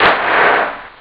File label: snare clap; Amiga Soundtracker Sample Packs